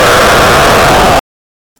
Jump scare

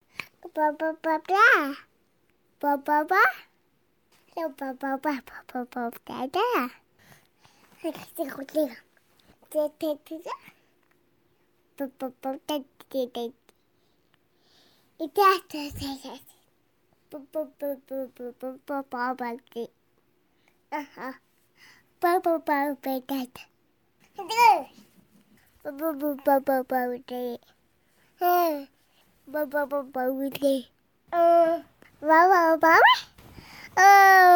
Baby Babble

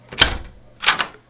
Door Open

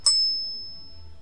Clock Bell

